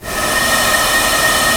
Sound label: Piccolo Air; Digital Sound Factory Virtuoso